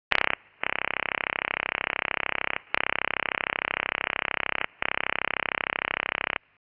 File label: OTHR 33.33, OTHR SYSTEM 33.33 Hz PULSING; ONERA/FRANCE, TX-SITE near Dreux; "NOSTRADAMUS"